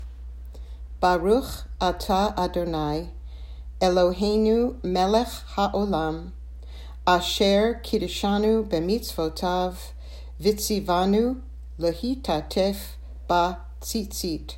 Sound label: Talit Blessing